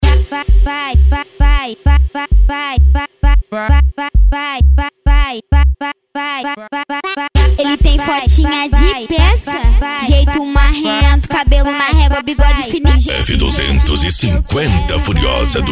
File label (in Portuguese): funk e sertanejo